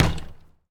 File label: Street Ball Glass Back